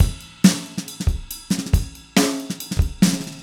Acoustic Kit, Drums & Percussion

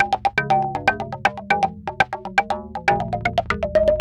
TALK, TALKDRUMSYNT, Yamaha VP-1; Music Store

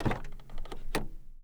SMALL DOOR, Transportation Doors Hoods Brakes

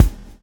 swing

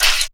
Vinyl Snare; Sonic Foundry (Sony Creative Software); Esoterik Beatz; Vinyl102 Kit

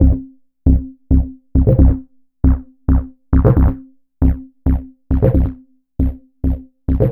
Techno Synth

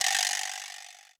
Roland U220 Electric Set, Drum Machines

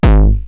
Roland TR-909 BD; BD Selection

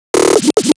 Scratch Fills 140BPM

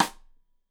Side Stick Stereo; Deeply Sampled Drums; SMDrum

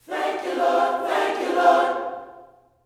THANK U, Gospel

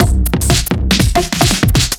Duo Swing Mix, 120bpm; off the grid

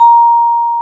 C LNG A#4, VIBRAPHONE; AKAI S-Series CD-ROM Sound Library VOL-7

